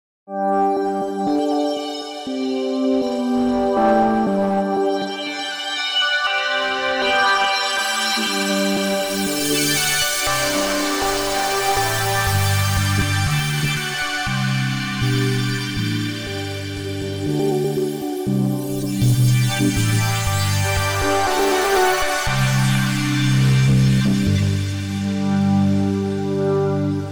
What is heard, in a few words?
Spark Quadratpad